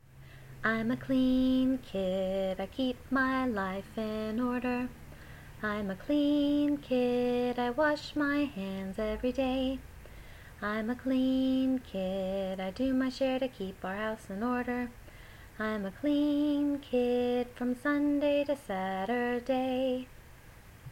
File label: Cleanliness Song